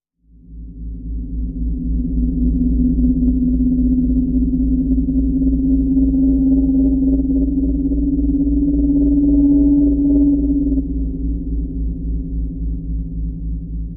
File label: Dronecraft Sting